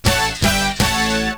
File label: punch; A small band playing three punch / chords.